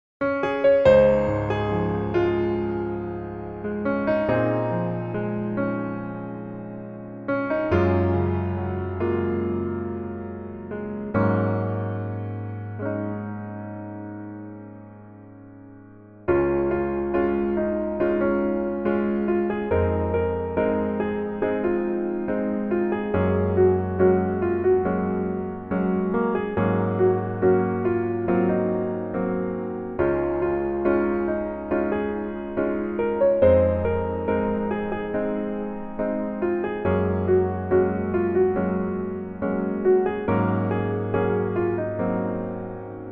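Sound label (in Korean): Piano version 멜로디MR -1키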